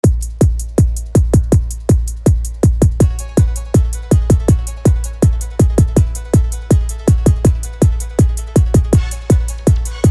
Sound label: Hard Bass Mix